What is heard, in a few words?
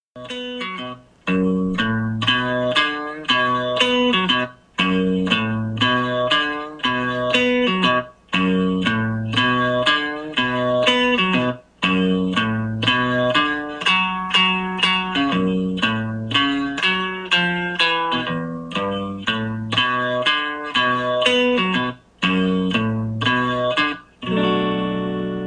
money lick